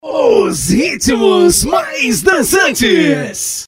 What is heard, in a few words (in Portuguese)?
os ritmos mais dançantes